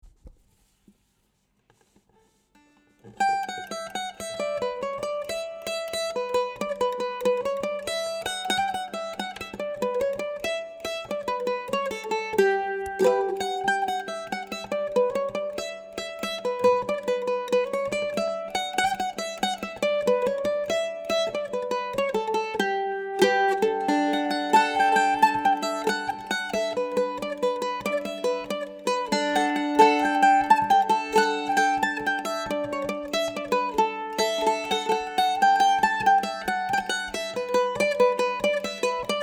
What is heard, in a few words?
mandolin